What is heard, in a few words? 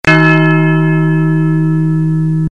bell; svencoop; FZSaySound